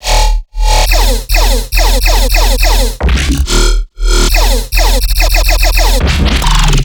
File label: Riddim Bass Loop E 140; Tesla; Ghosthack; Riddim Essentials Volume 2